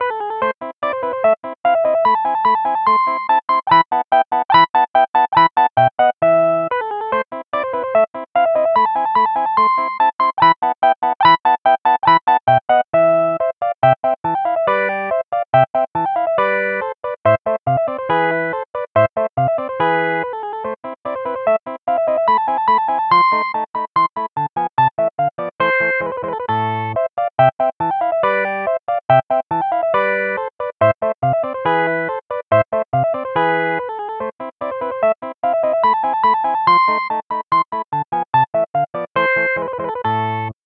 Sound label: jazz guitar